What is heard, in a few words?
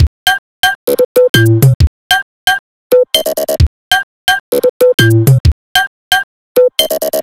beat